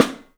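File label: PICOLO SNRS, snare, drums; 3D Sound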